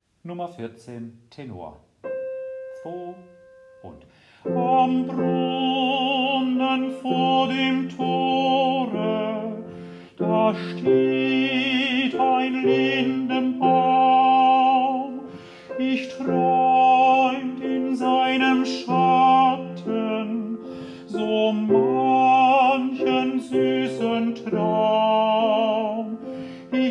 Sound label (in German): Tenor; Dioezesan Caecilien Verband; DCV Chorfahrt; Übaufnahmen